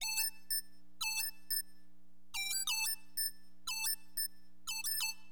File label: ARPY, HipHop, Keyboards; LiveAct Pool 1